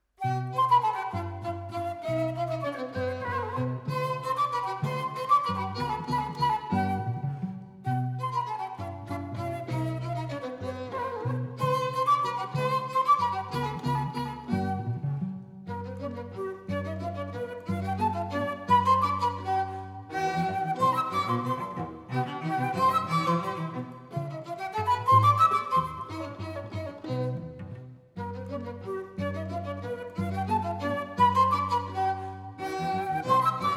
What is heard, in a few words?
quartet C-dur Allegro; Mozart; KV171; movement 3